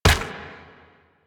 Proto Infested Runner Step Default

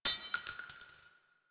Belt Fed Rifle Links; Warframe; Tenno; Frumentarius

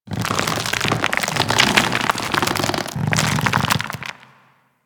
Golem Scythe Struggle Foley; Warframe

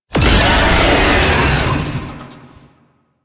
Lasrian Tank Infested Vocal Pain; Enemies; Barks; Warframe

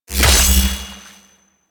Fishing Spear Fish Caught; Warframe; Venus Fishing Spears

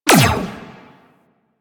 laser fire; Enemies; Warframe; Vomvalyst; Teralyst; DE

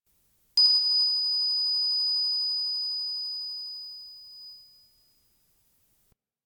Flashbang Ring; Warframe; Grineer Death Squad; Weapons

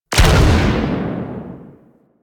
Ship Primary Cannon Fire D; Weapons; Warframe; Railjack

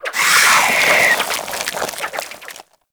Infested Face Hugger Latch Vocals; Warframe

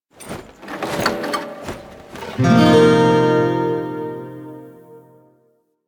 Shawzin Equip; Warframe; Lotus Shawzin

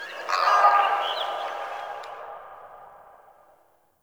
Swamp Pelican; Ambience; Midrath Island

